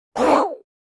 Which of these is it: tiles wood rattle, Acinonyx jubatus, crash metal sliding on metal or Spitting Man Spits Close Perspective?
Spitting Man Spits Close Perspective